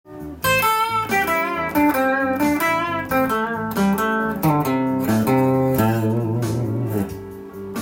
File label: blues solo; guitar; blues guitar rhythm problems